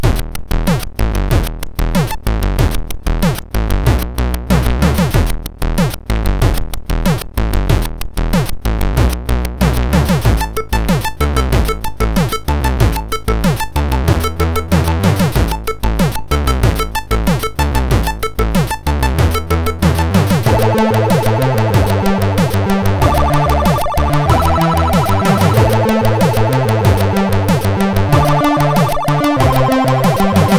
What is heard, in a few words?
Bass Jammer, sid MOS6581R2